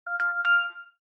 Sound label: samsung sonar ringtone